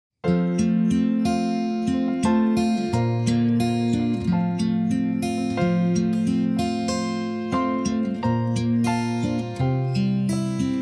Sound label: Backing; jardim infancia